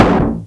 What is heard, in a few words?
Thunk Dud, MultiMoog